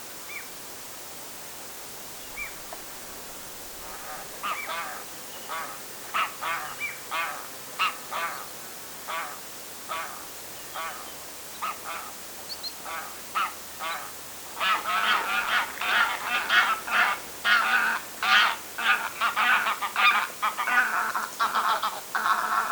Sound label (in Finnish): kotihanhiperhe vaihtaa ruokailupaikkaa rannalla lentaen